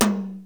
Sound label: Tom Hi, Yamaha DD-7